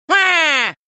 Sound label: waaaa